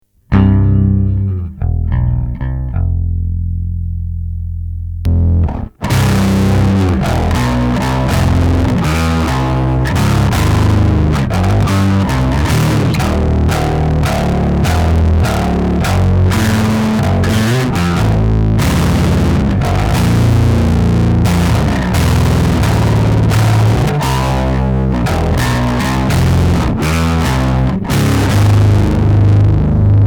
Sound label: bass